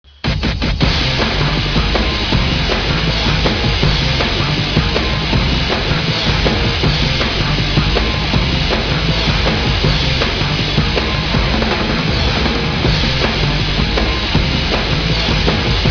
microwaved; valve; de ratslife

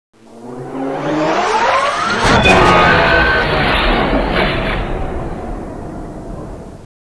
mship flyby right

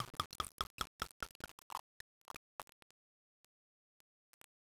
beer; valve; barroom

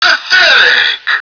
boss hurt

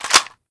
clip out, weapons; cstrike

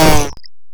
buzzer hit; cstrike

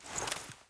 rifle equip; cstrike

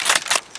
bolt down, weapons; cstrike

